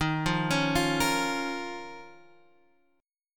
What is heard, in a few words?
Eb 7th Suspended 2nd Eb down Guitar Standard; Eb7sus2 arpeggiated; JGuitar